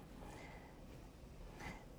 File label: Birds